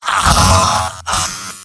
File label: pain severe; engineer; tf2; mvm